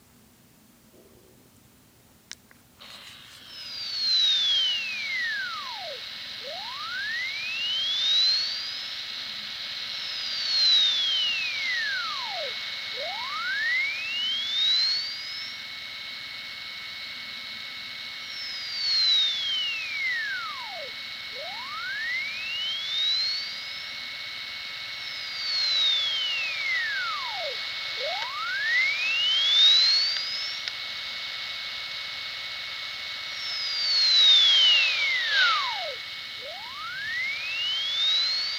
harddrive sending out sweep

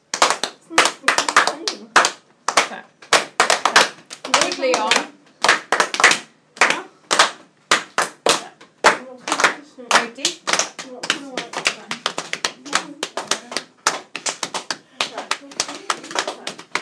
timbre improvisation

